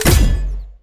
atk mortar

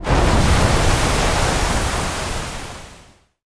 seawater influx

